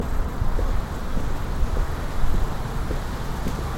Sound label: walking